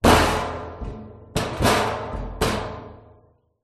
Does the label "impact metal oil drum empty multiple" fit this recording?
Yes